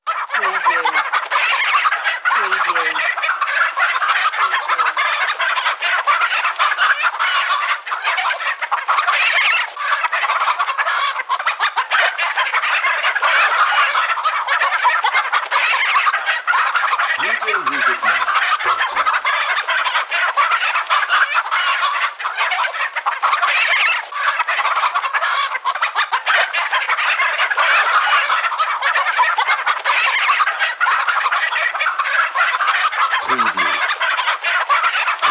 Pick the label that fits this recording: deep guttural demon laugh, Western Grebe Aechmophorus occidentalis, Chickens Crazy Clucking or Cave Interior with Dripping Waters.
Chickens Crazy Clucking